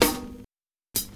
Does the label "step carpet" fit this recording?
No